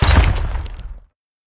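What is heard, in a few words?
shield, impact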